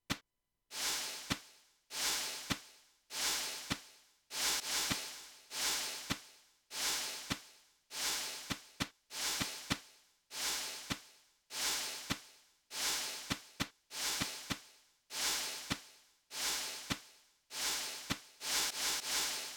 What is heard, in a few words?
Slow drums